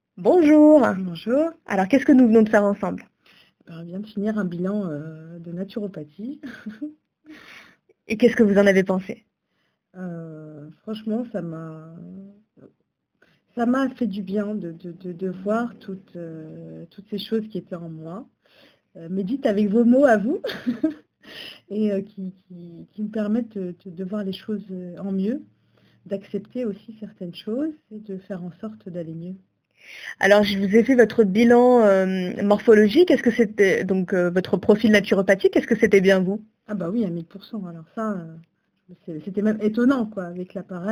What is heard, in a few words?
Vocal